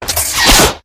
spider jump; Minecraft; Votive 1.21.1; Votive Content

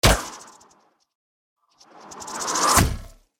arrow whistle; Minecraft; Votive 1.21.1; Votive Content

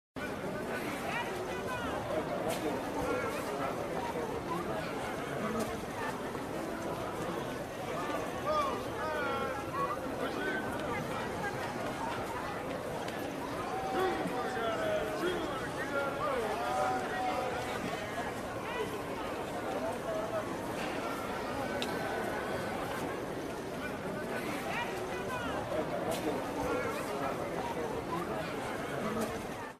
market; Minecraft; Votive 1.21.1; Votive Content

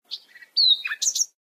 bird song; animalsvotive; Minecraft; Votive 1.21.1; Votive Content

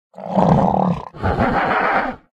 griffin sound; minecraft; Votive Content

